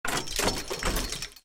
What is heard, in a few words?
rocket reload start